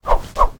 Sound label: medieval sabre swing; still north media